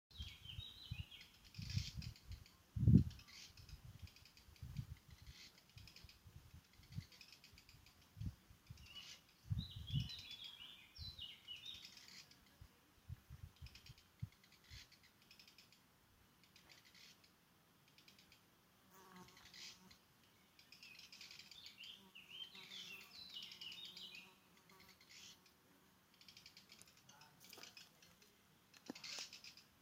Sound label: Black Redstart Phoenicurus ochruros; Ladakhi name:Sentic-nakpo, beda chipa